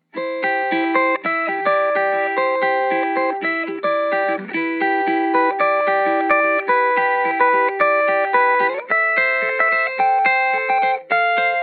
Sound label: sunny day guitar